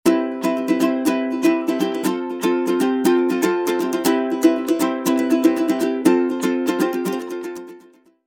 Ukulele; Coolpad; ESurfing-No1-2021

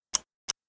notification; Samsung Gear

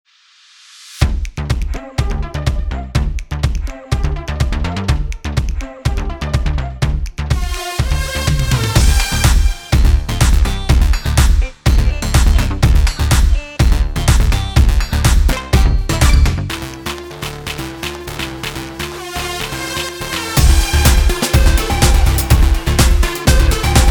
Funky; Samsung; The Voyage variants

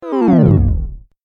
Low Battery; Tecno M9; ui

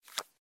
Unlock; Coolpad Dazen X7

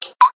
Tinkling, notifications; Infinix; XOS 12

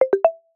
mms Sent; Vivo; OriginOS 1.0

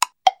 Knock, notifications; Coolpad; Note-8

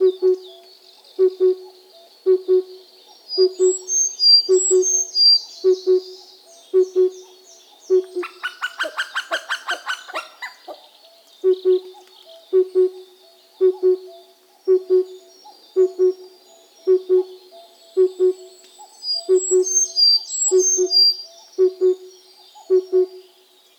Cuckoos whistle in wild hokkaido; Google; Google Sounds; Sound Matters

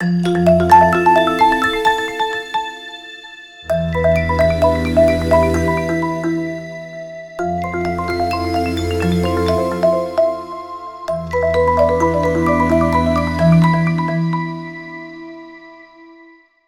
Sunshine, ringtones; Honor; 600 Pro China

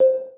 Video Record End, ui; Huawei; Ascend Mate MT1-U06

